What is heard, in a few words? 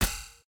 arrow